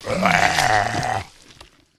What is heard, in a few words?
zombie attack